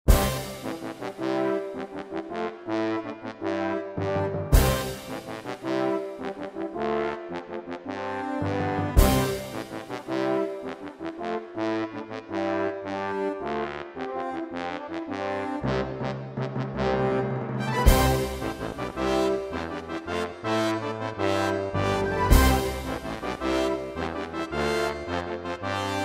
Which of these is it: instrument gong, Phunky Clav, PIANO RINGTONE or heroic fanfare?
heroic fanfare